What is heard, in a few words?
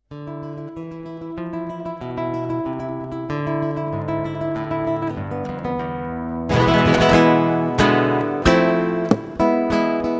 soleares, guitar